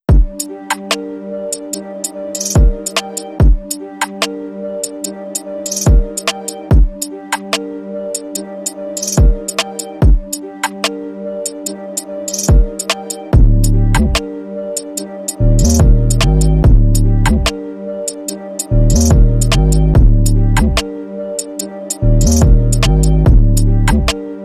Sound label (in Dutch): muziek